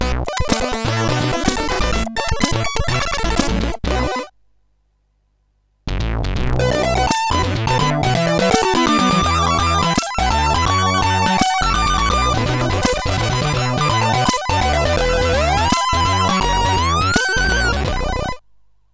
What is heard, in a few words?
sid CSG8580R5